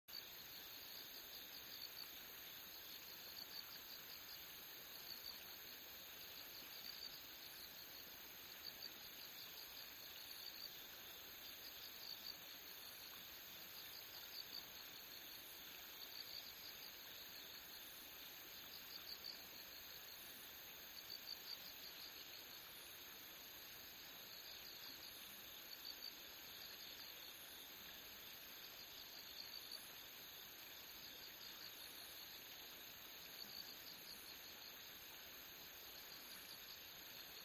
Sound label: riverside